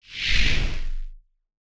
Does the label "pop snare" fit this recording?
No